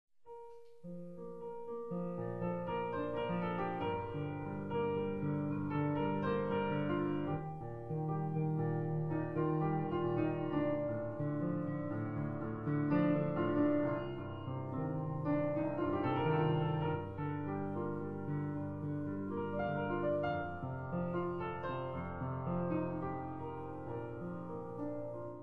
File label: piano